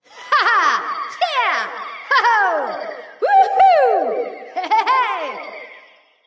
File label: yay light; smite; voicepack; hel